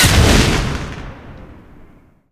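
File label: explosion generic medium; Source Engine; Portal 2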